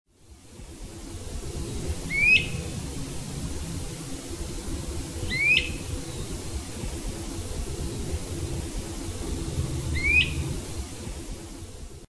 Myiarchus tyrannulus

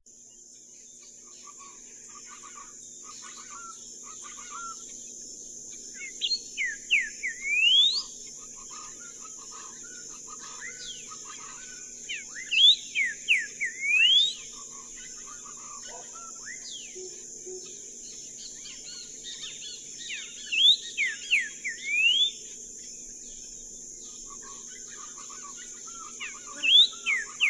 Saltator coerulescens

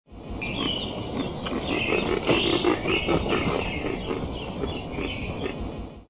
Oreophasis derbianus

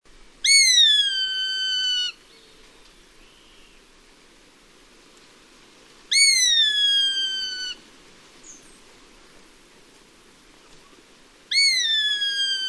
Asturina nitida

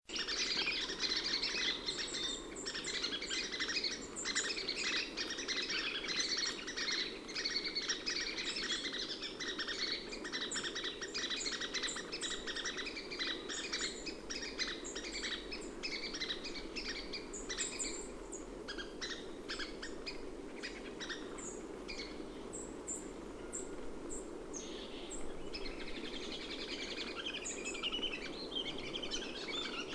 Sitta pygmaea

